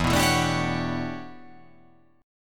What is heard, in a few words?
E Minor 13th chord